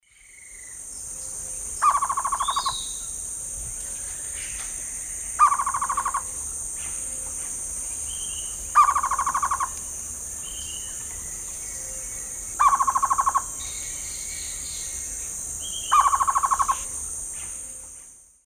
Thamnophilus praecox, calls male; S. America; Eastern Lowlands

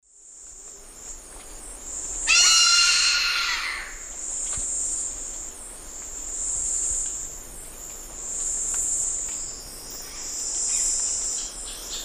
Daptrius ater, calls; S. America; Eastern Lowlands

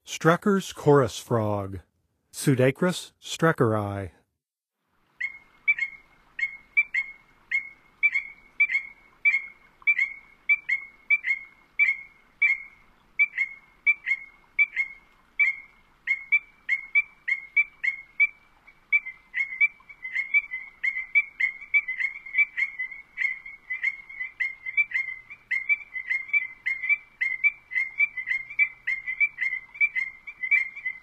Streckers Chorus Frog; N. America; Songs of Frogs & Toads of North America

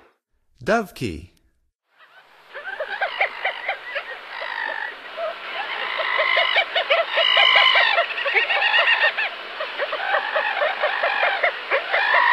Dovekie, Songs of Birds of North America; Stokes

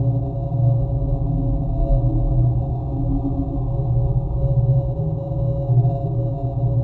Psycho Drone; Dance; SFX

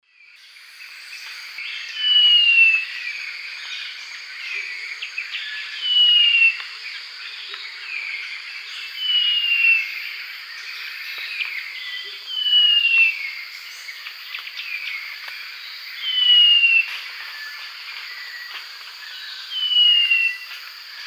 Hylophilus ochraceiceps, song; S. America; Eastern Lowlands

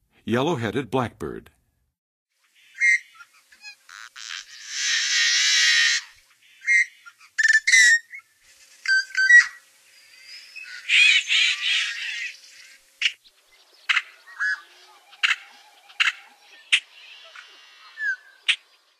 Yellow-headed Blackbird; North America; Western USA; Western USA Songbirds

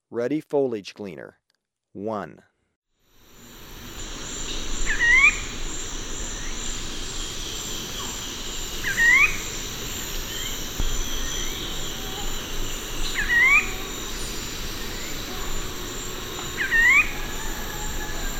Ruddy Foliage-Gleaner; S. America

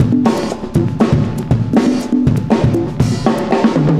cooling 120, loops, 120bpm

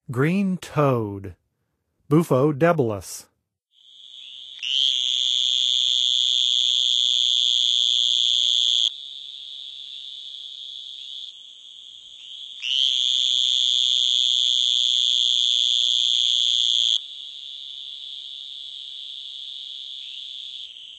Green Toad; North America; Songs of Frogs & Toads of North America

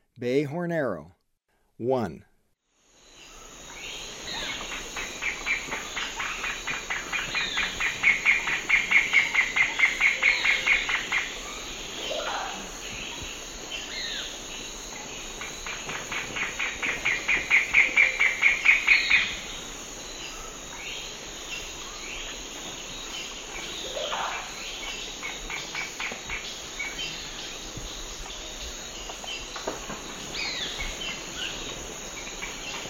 Bay Hornero; S. America; Eastern Lowlands

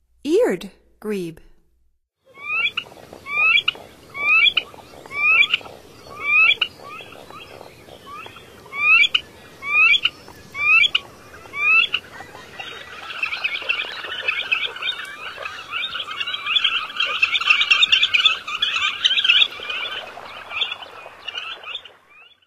Eared Grebe; North America; Stokes

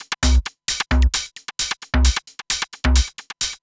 HiHat Perc Loop, Dance Beats

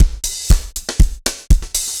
dance floor tight 120, loops

